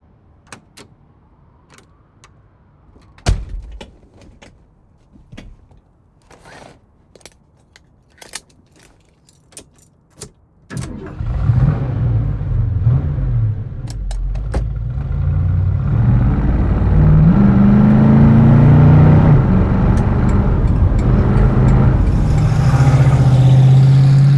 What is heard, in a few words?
Ford Mustang Mach 1; aXLsound